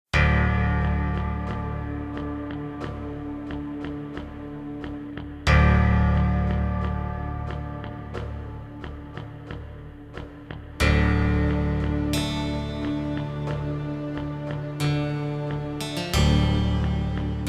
Music menu

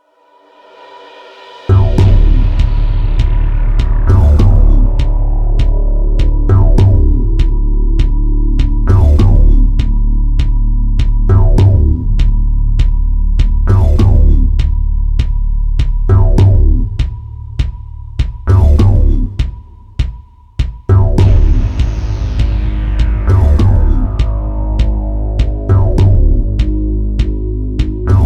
Music Loop Stem; gamerip; Sniper Elite Nazi Zombie Army 3

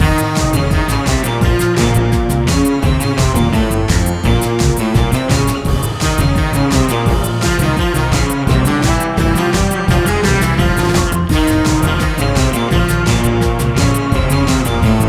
Combat High loop; GraveyardOps

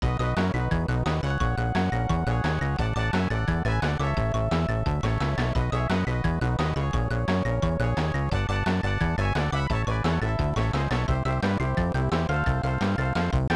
Set Match BGM; power spikes arcade

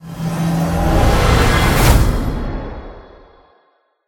UI Soul Open; bellatia

